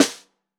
Snare Bottom

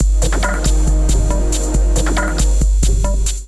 Kit Melodic 138 bpm; MIDI Clips; Drums; Aero Kit; Ableton; Factory Packs; Skitter and Step

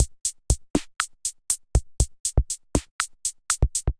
Kit, Drum Machines; D55 Lifeline Kit; Ableton; Factory Packs; Drum Essentials

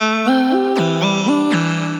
Digital Voice Machine, Transformed Voices; Chromatic Voices; Ableton; Factory Packs; Voice Box

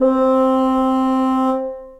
Bassoon Solo Legato; Ableton; Orchestral Woodwinds

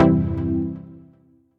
Pluck, Synth Keys; Mouse House Pluck; Ableton; Factory Packs; Singularities

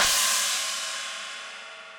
China Warm And Low, Cymbal; Drums; Drum Hits; Standard Hits; Ableton; Factory Packs; Session Drums Club

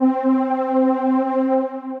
Pad; Hang Pad

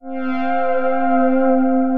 Analog Pad; Instruments; Ethereal Pads; Ableton; Mood Reel

